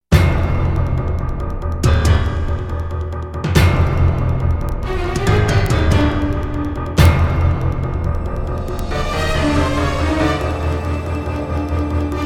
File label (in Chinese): 电视包装音乐